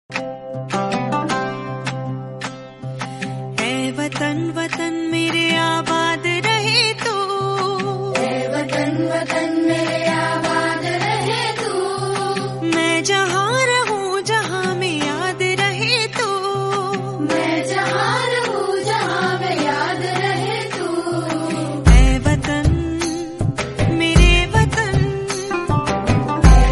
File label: female, ringtone; raazi